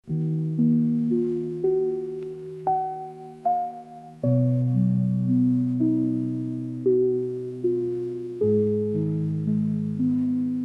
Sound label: lofi